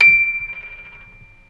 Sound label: MUSIC BOX D5